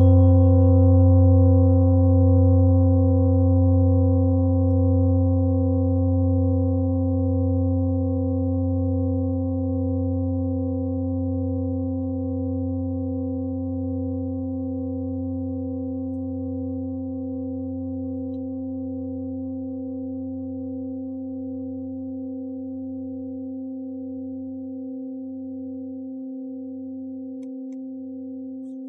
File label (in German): klangschale nepal, Klangschalen Nepal